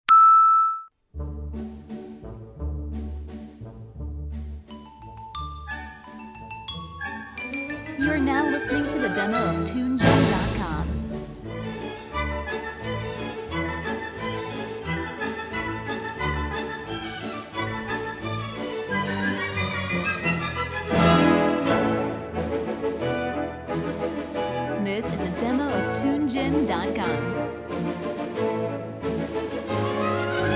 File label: Polka